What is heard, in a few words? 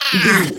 goblin fairy hurt pain